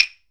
HYOSHIGI, JAPAN PERC; AKAI S6000 CD-ROM; WorldSounds

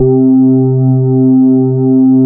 Korg POLY800 C2; Back In Time Records; Korg Universe VOL-1

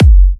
F#, Kicks; markus hakala

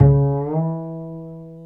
Bass, Roland

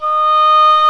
WINDS & BRASS

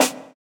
Snare, JUNGLE BREAKS

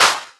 Claps; Vengeance; Essential Clubsounds Vol.4

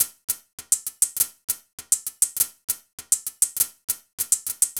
Hats Beat, Electronic Beats; Sonic Foundry (Sony Creative Software); Myths of Technology

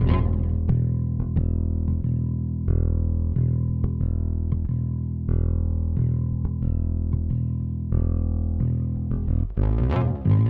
Delay, Bass; Bass X

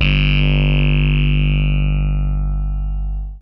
Distorto Bass Note; Sonic Foundry (Sony Creative Software); Ugly Remnants VOL-2

